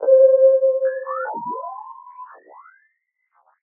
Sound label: SYNTH (C), Pedal One Shots; Pluto; Cymatics; ANALOG EVOLUTION Beta